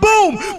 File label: boom